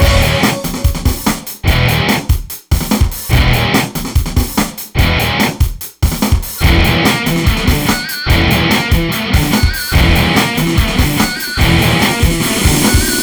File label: Hardcore Breakbeat, 145 Emin; Big Fish Audio; Hardcore Breakbeat Action; Breakneck